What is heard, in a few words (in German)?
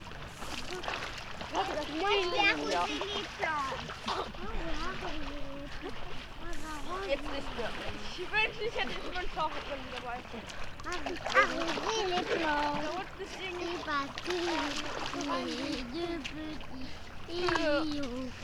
badestelle